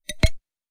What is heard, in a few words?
Clicks weird